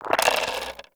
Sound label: Dice Roll Five; Warframe; Koumei